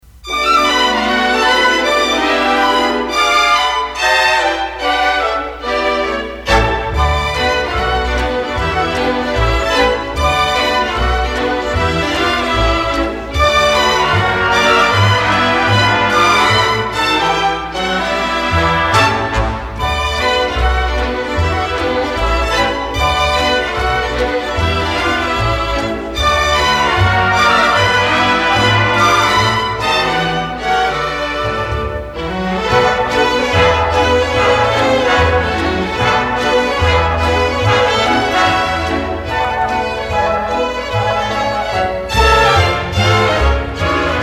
legato; anderson

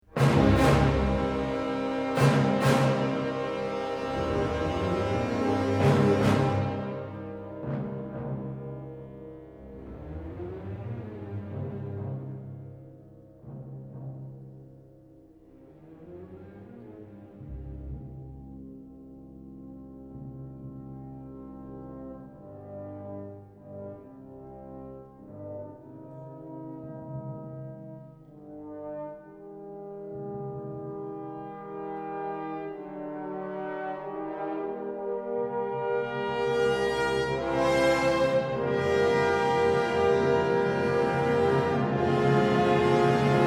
Funeral March; Wagner; Siegfrieds Death

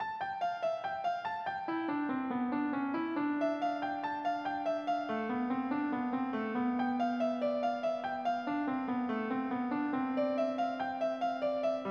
C-Major Invention; Bach

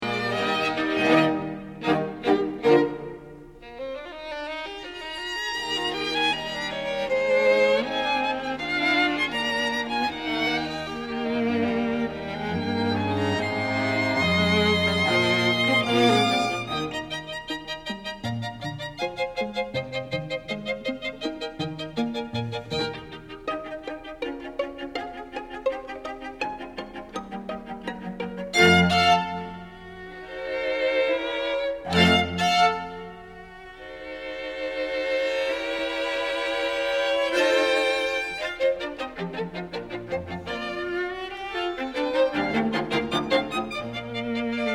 String Quartet example; Beethoven; String Quartet no10

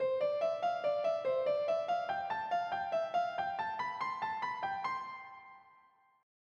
C-Major Invention; Bach

